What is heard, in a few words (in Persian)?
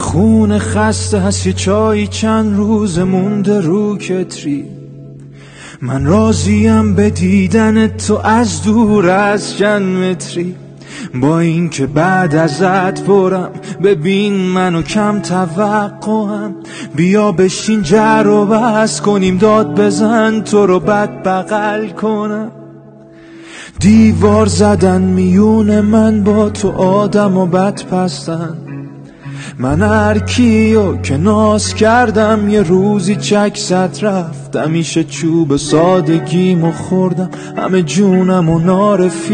Ba Gitar